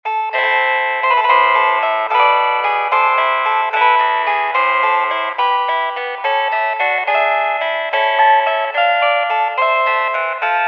harp bandwidth; antiquing

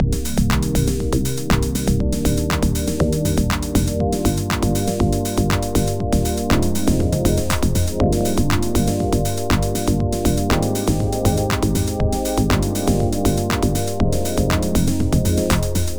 music unprocessed

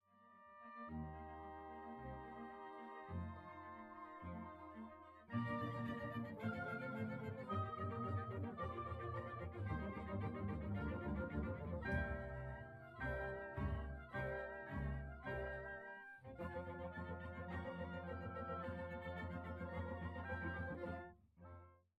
anechoic Orchestra -90deg

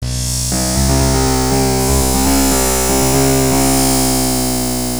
drummix

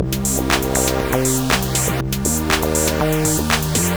music processed

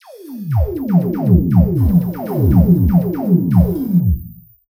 drum mix

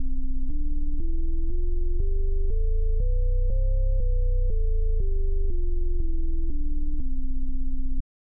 c major scale bass, hammond

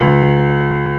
PIANO MEDIUM B1

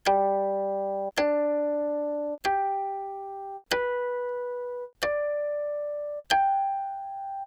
Harmonics Recorded, guqin

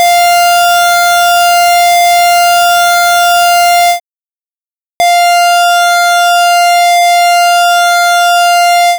pwm

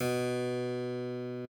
D1 pp, real harpsichord

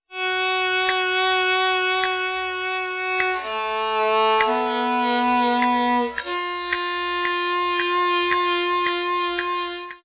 cast viol

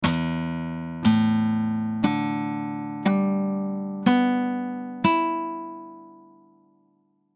open string hard pluck synthesis

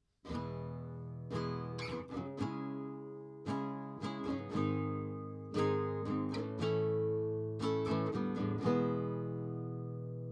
live Guitar; baseline; microphone Recorder

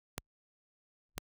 pink imp

